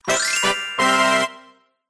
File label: player wins